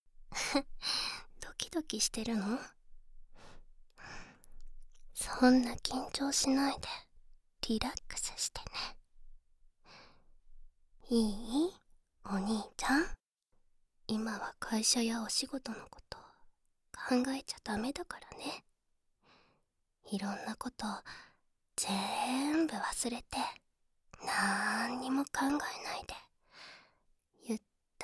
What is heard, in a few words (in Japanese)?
キレイになったお耳をペロペロ♪, ASMR; 効果音あり